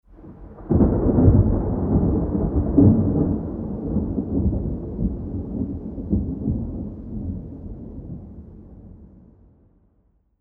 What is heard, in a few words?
thunder rolling; elevaudio